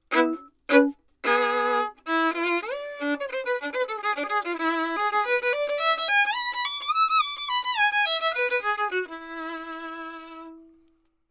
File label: violin lct940 fet; lewitt